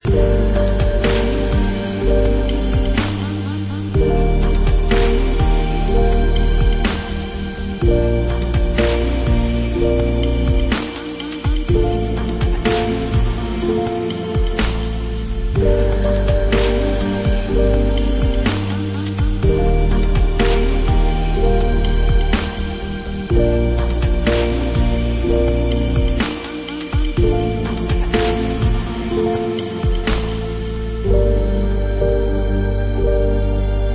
romantic

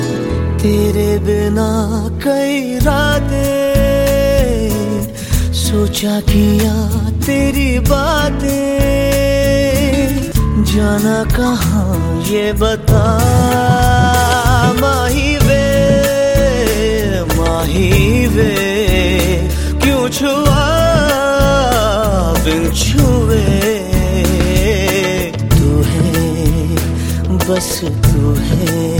unplugged

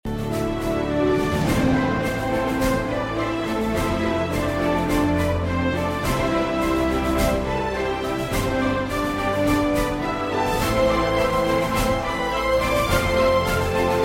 orchestra theme; metal gear solid

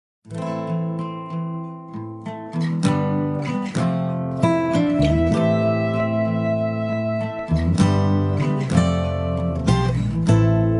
guitar

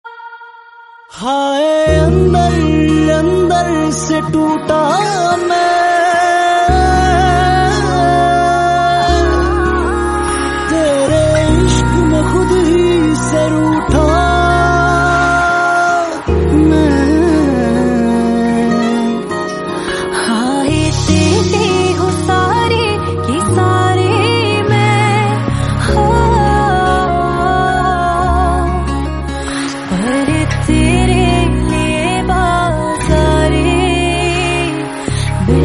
my best sad ringtone

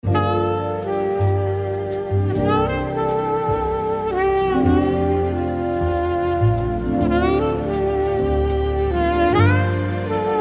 sax solo; taxi driver

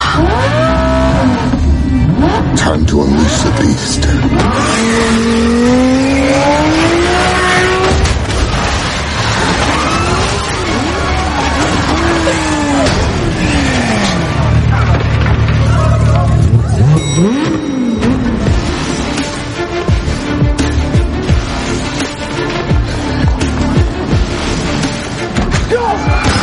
ferrari mix; fast 7 ferrari

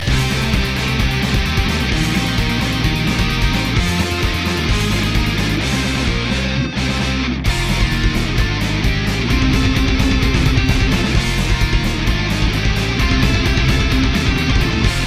instr; teenage mutant ninja turtles

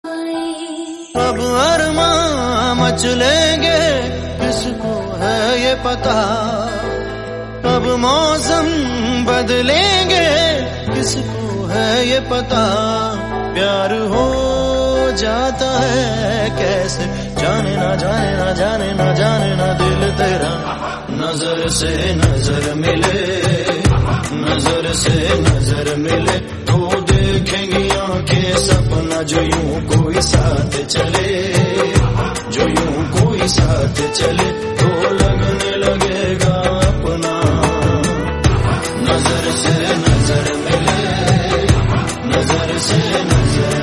hindi new ringtone

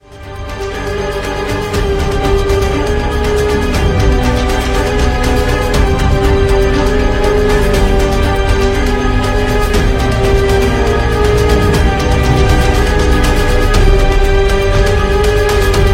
ringtone

